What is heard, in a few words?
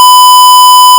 Sqr Osc 1000